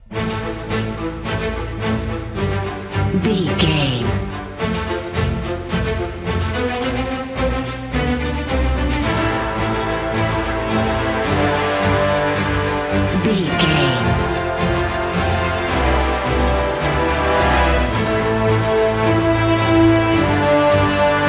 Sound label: epic cinematic no drums